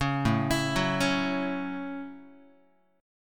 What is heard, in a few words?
A 6th A Guitar, chord; arpeggiated; JGuitar; 4,5,7,4,5,x